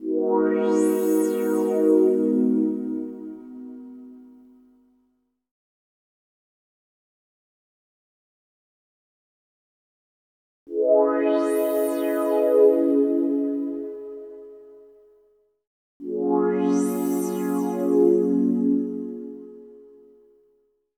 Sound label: Bitter Pad; Zero-G Smoke